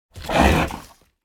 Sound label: bear attack; mobs; mobs mc